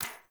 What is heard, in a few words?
Menu Hover, UI; Warframe; Awakening Remaster